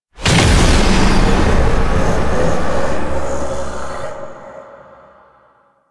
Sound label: Full Health Stinger; Enemies; Warframe; Entrati Doppelganger